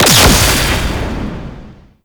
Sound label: Mine Explosion; Tau; Twelve Minute War